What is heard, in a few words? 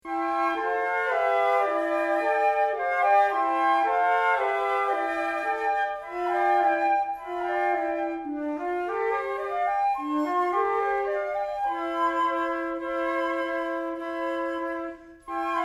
Carillons; Damase